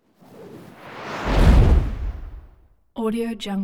whoosh slam whoosh bys doppler

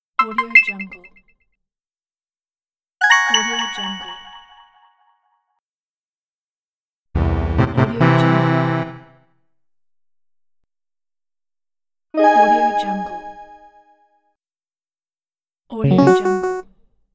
rewarded

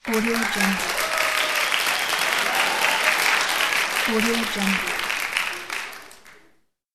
applause

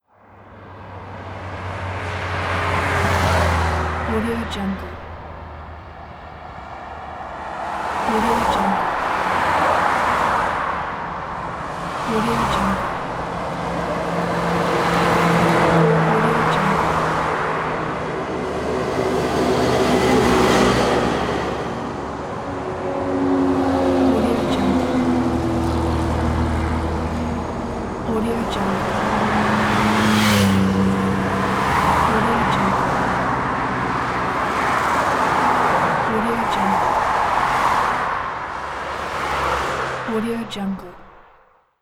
road background

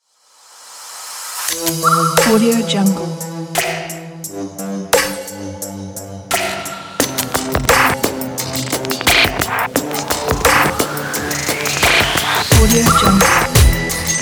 abstract ident

